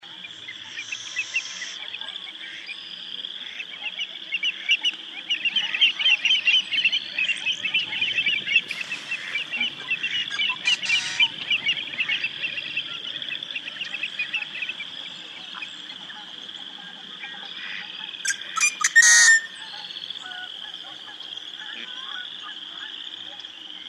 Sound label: Lakeside Evening, north dakota potholes